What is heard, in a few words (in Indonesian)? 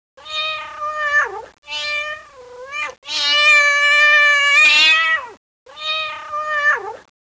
Kucing Meong